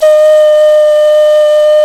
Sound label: D OCRNA, CLAYFLUTE; Africa; Spectrasonics; Heart of Africa 1